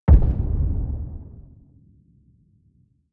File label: giant club distant